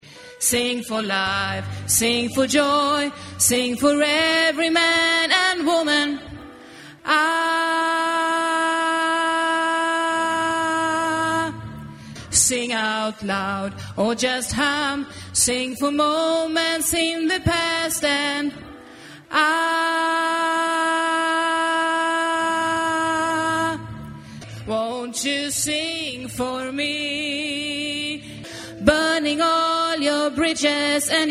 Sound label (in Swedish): alt, stammor